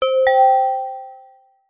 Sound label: multimedia alert notification basic bell synth positive; zapsplat